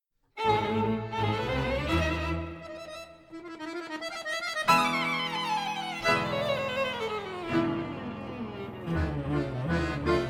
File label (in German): hummelflug